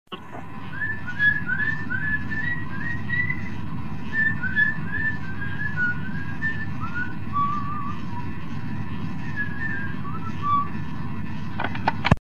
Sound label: Whistlin